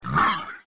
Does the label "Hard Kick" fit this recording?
No